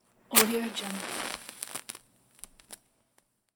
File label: striking match outside